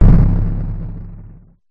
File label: Synth Dirty Distorted Blast Oneshot; sound spark